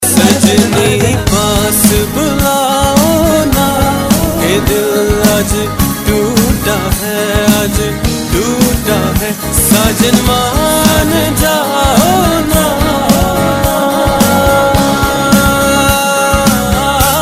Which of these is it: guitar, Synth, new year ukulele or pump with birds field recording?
guitar